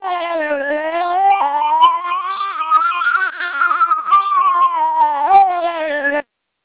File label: twelp 300 siren